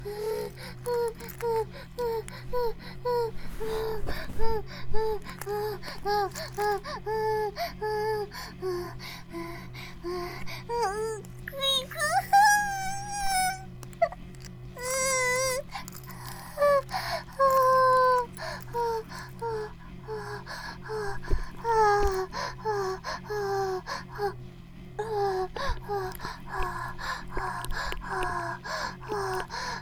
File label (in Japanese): バイブ放置; イクたびにわけて